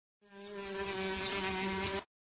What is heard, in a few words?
bee